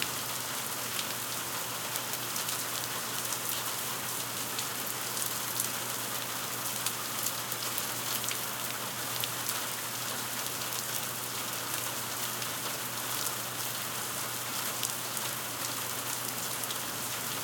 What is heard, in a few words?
Amb Rain Loop Roof-Outside; viewfinder; Mural